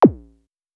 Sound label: BD kick